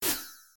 drop; Sorc

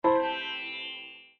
Garden Battery Critical, windows 7

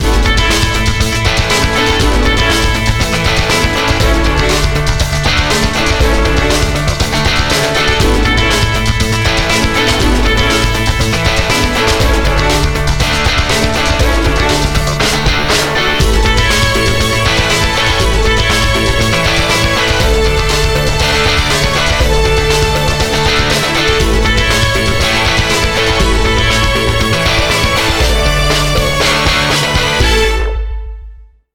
Victory (Win); Onuris; Speed Racer The Videogame; PS2; Wii

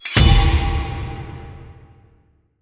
Ui Campaign Node Button; Android; Portal Quest

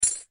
Drop Coin

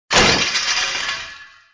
scaffolding break

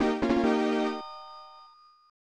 Unused Jingle; Rap Basketball; snes

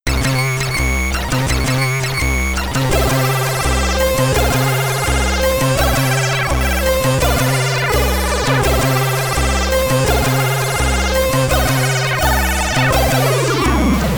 Shooting Sequence (SID Stereo)

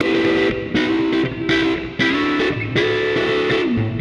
Guitar wet; Nimbus Station; lego universe macos windows gamerip 2010

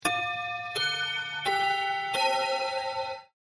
Transition complete!; Xenoverse Per Aspera Ad Astra; X-Zone

